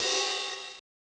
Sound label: hihat open